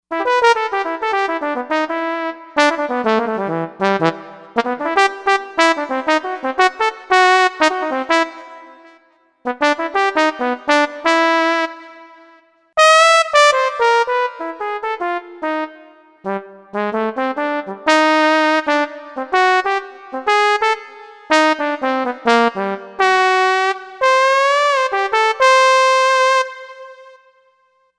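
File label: Brass Solo Trombone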